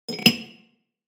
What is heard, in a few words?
Stacking glassware; household